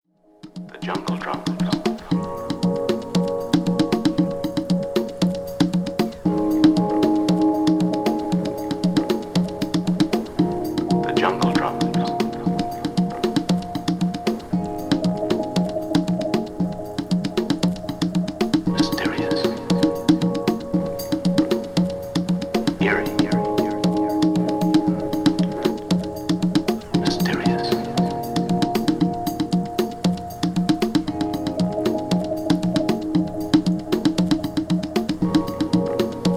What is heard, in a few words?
Drums